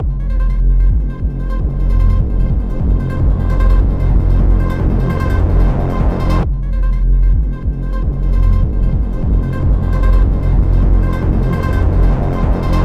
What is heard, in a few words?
Overtime Mid; Korps; Modern Combat Versus